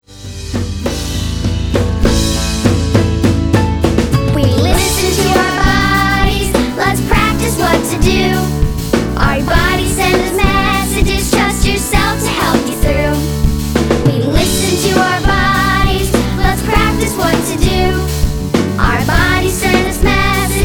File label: Chorus Kid Voices